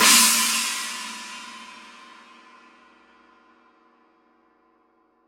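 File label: signature precision 18 china edge, cymbales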